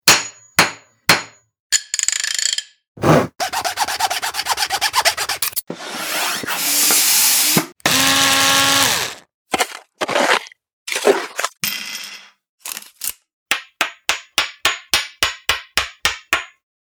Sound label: Tools, organic ui building game sfx; APPlicable Sounds